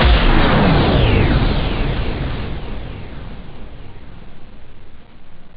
bang

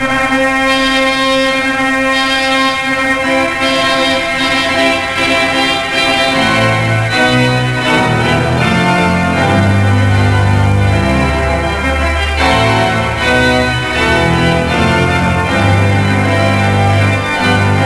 Wedding March; Wedding Planner 1.2; Our Wedding

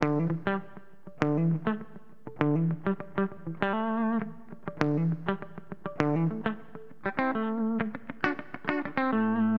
Acid Jazz Funk, Guitar, RIFF; LiveAct Pool 1

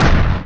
troll punch; Gamestar

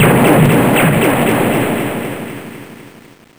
Fireworks Sound; Woden 1.3.4

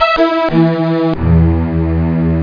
low strings; 10,549 sample rate; Amiga 8-bit Sampled Voice

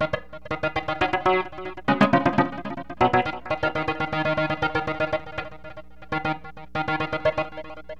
GATEDO, Rythme

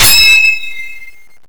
freeze

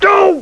End Game; Trinity Solitaire

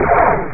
SHOT LAS; parasite

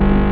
synth

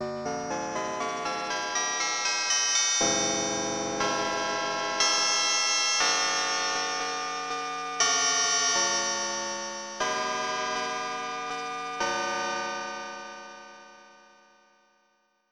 Sci-Fi Chimes

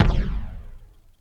BASSDRUMS; DRUM SOUNDS

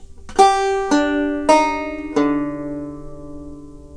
Sanxian; EarWax

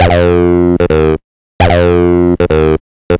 BEAT; maudio; Electro Crash

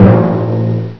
DEEP TIM, PERC

